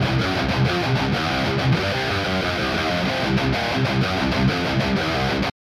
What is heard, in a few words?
tone match